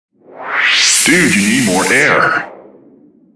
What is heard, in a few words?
Air Low